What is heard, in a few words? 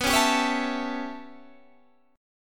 A 7th B, chord; A7/B; strummed